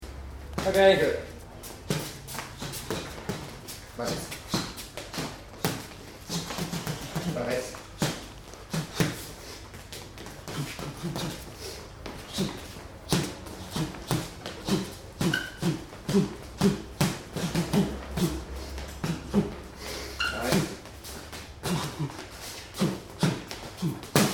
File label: Boxing